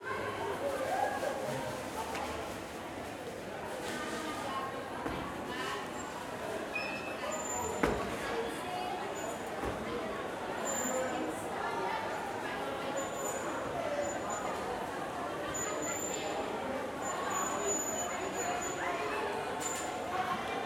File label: South African Ambiences Interiors; TheWorkRoom Audio Post; South African Ambiences - Interiors 2